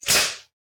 snake attack

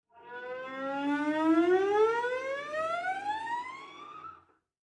Cello Slide Up; SoundsCrate

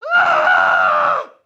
man scream fear; soundscrate

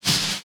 lightsword clash; soundscrate

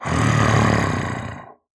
growl; soundscrate

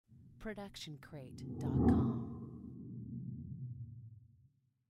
scifi fly by

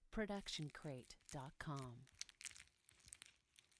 squishy long; soundscrate

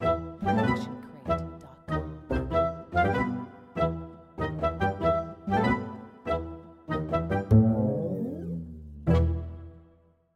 Logo Ident Mischievous Plans; SoundsCrate

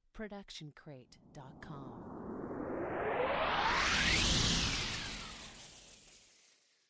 Cinematic Riser

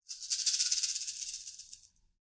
rattle snake; soundscrate

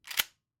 Shotgun Open; SoundsCrate

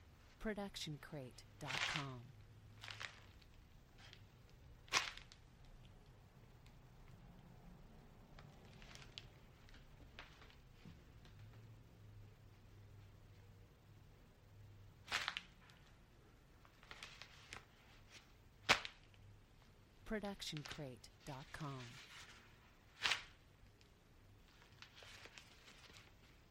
footfall outdoor steps; soundscrate

